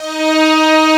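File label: strings D#4, YAMAHA, Analog Fantasies; USB Soundscan vol.49; AKAI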